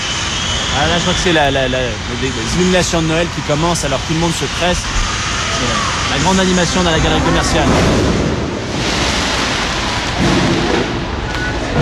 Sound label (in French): Au Venezuela centre commercial